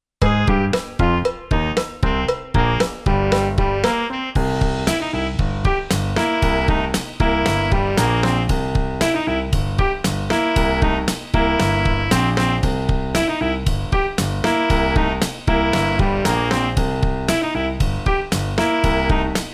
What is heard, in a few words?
Funk A Licious